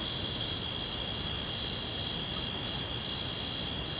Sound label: bug night, ambience